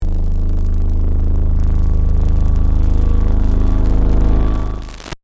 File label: Distortion Swell; Blast Wave